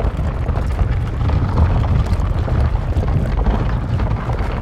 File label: Ability Prison Travel; Evolve; Behemoth